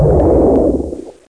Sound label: Spell Fails; Death Blade